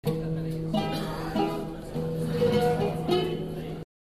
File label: loop